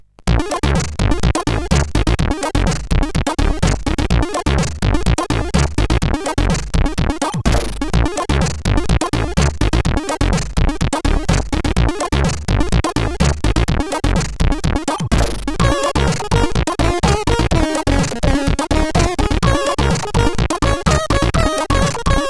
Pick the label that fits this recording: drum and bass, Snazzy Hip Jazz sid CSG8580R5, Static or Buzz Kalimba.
Snazzy Hip Jazz sid CSG8580R5